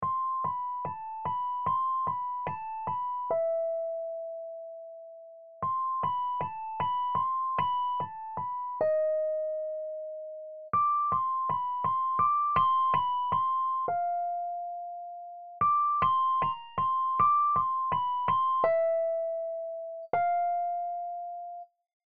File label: rhodes; snow